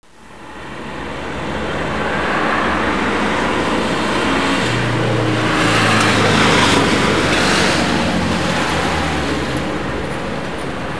streetcars

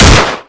ber92f fire, weapons; firearms

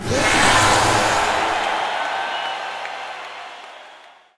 crowd happy; svencoop; thearena

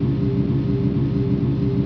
pulsing whine; co sava

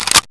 Spring bolt forward, weapons; dod

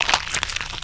cer bite, perro

